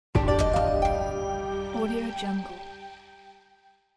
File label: antique greece win; tibasound